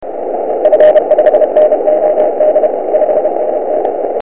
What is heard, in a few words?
cw